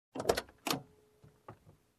Unlocking Car

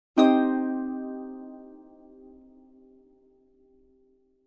High minor chord strum

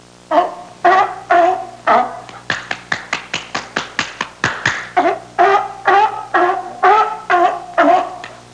Seal sound effect long

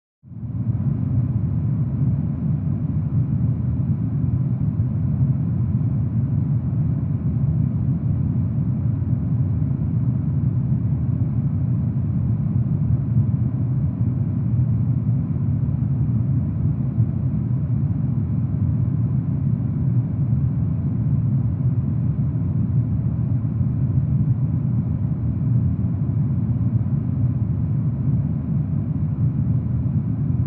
Airplane Engine Hum

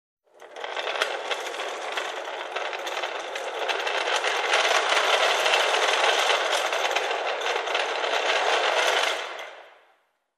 Pushing a Folding Platform Trolley

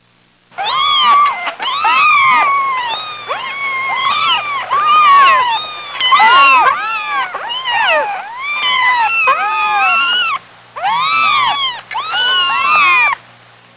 Meowing kittens vintage

